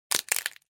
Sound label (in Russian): hrust kostej